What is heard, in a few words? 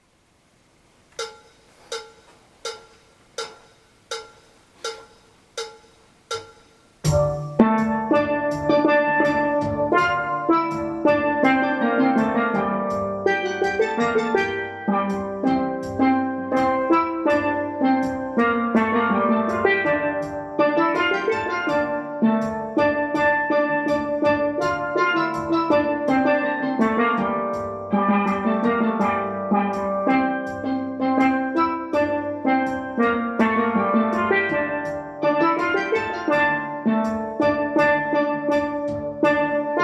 ALTO, Steel Band